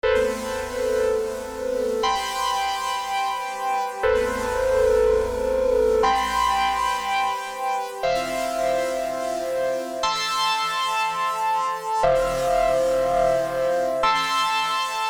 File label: Am120 Rogue Chords